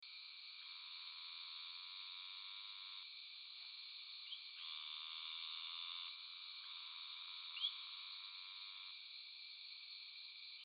Hypopachus variolosus; microhylidae

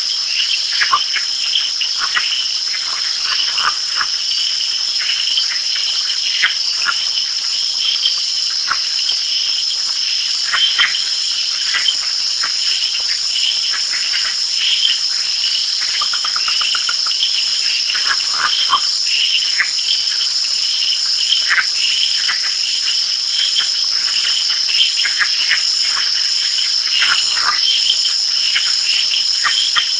Agalychnis callydrias; phyllomedusidae; Agalychnis callidryas

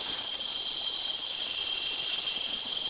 Craugastor, craugastoridae; craugastor sp4

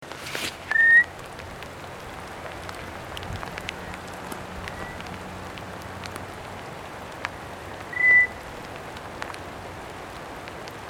eleutherodactylus grandis; eleutherodactylidae

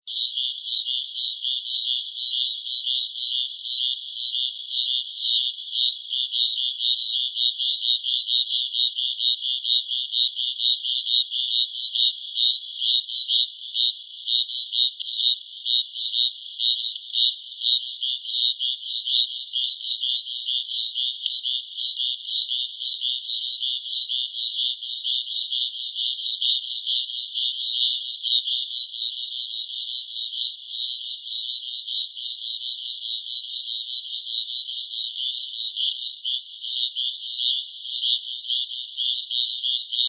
Scinax stauferi, hylidae

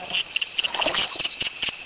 Dendrosophus microcephalus; hylidae

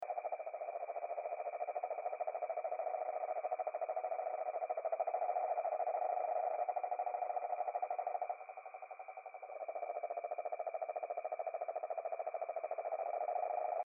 Rhinella horribilis, bufonidae

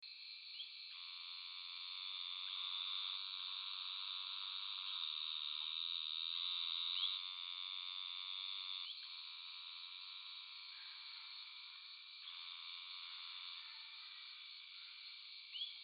Hypopachus variolosus; microhylidae

